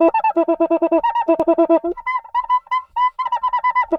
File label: CUICA, DRUMS SAMBA; Best Service ProSamples vol.14; AKAI